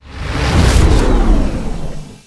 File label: brake; gmod; lvs star wars; vwing